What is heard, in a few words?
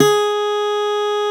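Steel Acoustic Guitar B2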